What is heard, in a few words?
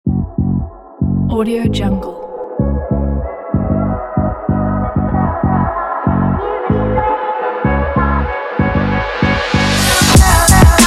fun hiphop